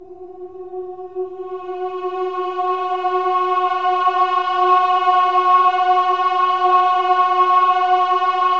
OH-AH F#4, choir; Spectrasonics; Symphony of Voices

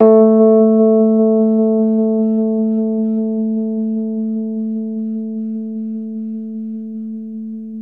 Rhodes 73 Slow; E-MU Producer Series Vol. 5 – 3-D Audio Collection; 3DRhodes

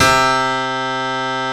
GRAND C2, PIANO

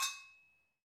Brzl Agogo; Club-50 - Foundations Roland; Agogos; Fiji

